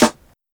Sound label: Perfect Snare One Shot A# Key, Royality free steel snare drum tuned to the A# note; snare drum samples